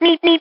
Meeb Meeb

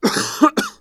Coughing Man

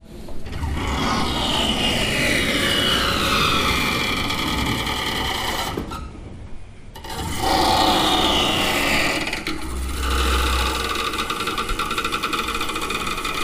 Automatic Electric Doors

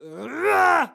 Male Attack Cry

